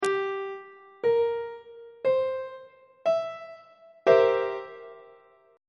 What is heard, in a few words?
b sharp dominant 7th 2nd inversion on treble clef